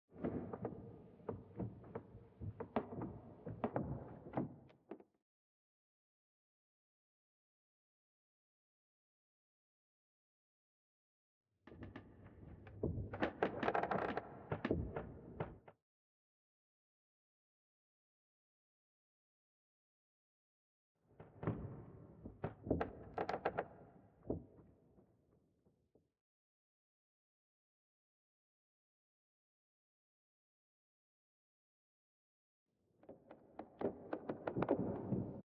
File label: Misc Wood Creaks